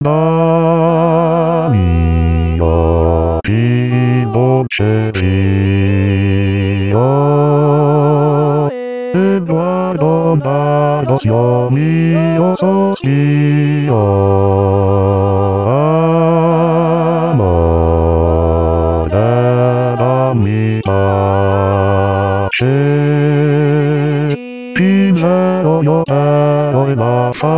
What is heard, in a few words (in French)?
hommes